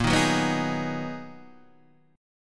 Ab Minor 7th Bb chord; Abm7/Bb; strummed; JGuitar

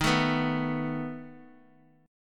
A Suspended 2nd Flat 5th D sharp, guitar

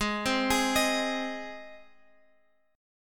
Ab Major Ab down Guitar Open G

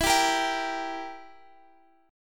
E Diminished E, Guitar, Open F; strummed; x,x,16,14,13,14

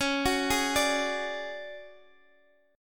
D Major 7th Flat 5th Db, chord

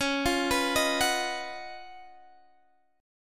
Db 11th Db, down, Guitar Standard; arpeggiated; JGuitar

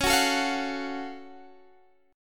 F sharp Minor Major 7th; chordshape; 21,21,19,22,19,x

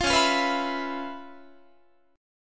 Db 7th Sharp 9th Db down Guitar; 20,21,20,21,20,22; Guitar-4A,4E,5A,5D,5Gsharp,6Csharp; Db7#9 strummed

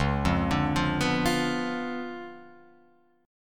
Db 7th Flat 9th, Guitar, Open F; Db7b9 arpeggiated; JGuitar

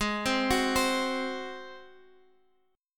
G sharp 7th, Guitar, Half Step Down

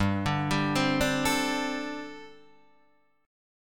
G Suspended 2nd Suspended 4th, Guitar Open D

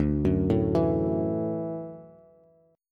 D-Major Add 9th D down Bass; arpeggiated; JGuitar

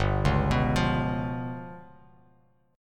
G 7th Flat 5th down Bass Standard; arpeggiated; JGuitar

